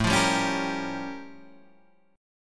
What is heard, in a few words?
A Augmented 7th Flat 9th A; strummed; 1,3,sharp5,b7,b9; JGuitar